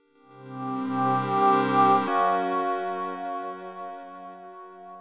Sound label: Jingle, Item Acquisition; YU-NO A Girl Who Chants Love at the Bound of This World